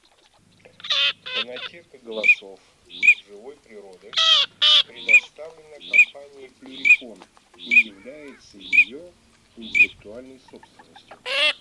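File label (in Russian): Чирок-свистунок Alzavola, манок; plurifon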